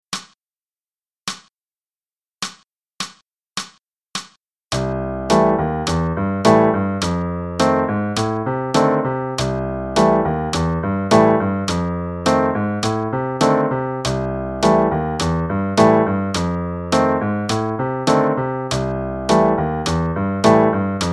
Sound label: loop for improvising, qn=210; Level 1 Performance